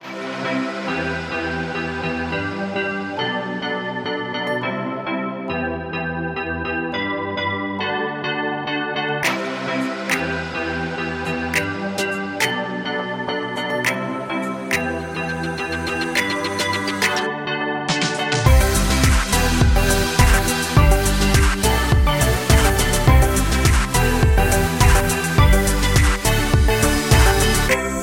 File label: Future House BPM104